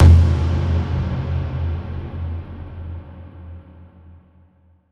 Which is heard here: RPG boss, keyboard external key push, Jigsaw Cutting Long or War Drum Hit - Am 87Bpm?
War Drum Hit - Am 87Bpm